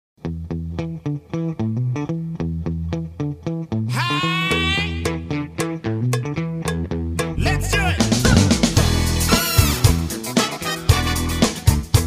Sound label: funky, Massachusetts wedding band; colours